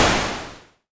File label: explosion, digital